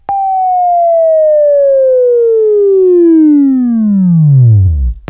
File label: WAV Left, Sweeping; Sweeping Training program